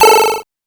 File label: Arp A; chip hits; arps; CHIPSHOP DELUXE